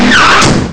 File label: car break; ST-XX Amiga Soundtracker Sample Packs